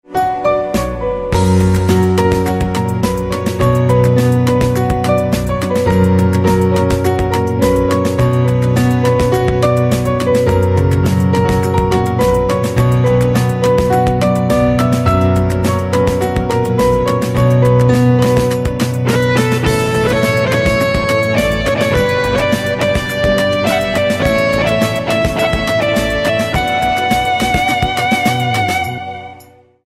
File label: Piano with Guitar Instrumental Ringtone